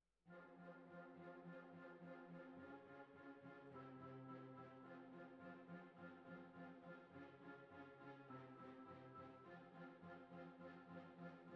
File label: Cbasses